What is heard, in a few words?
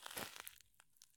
soil walk; Escape from Tarkov